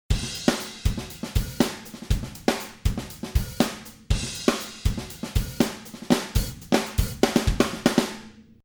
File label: drum software instruments